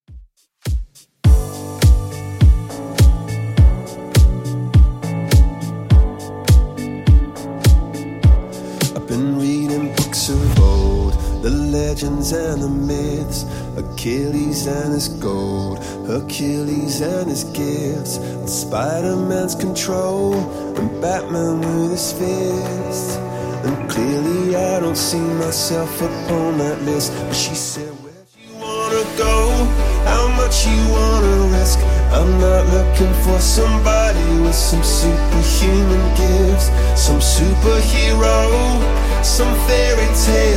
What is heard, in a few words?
103bpm